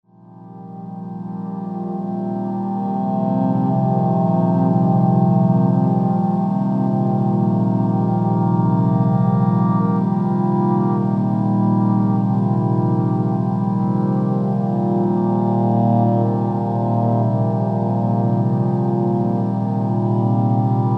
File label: Drones Deep